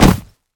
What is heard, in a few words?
obj drop; Original TK Sounds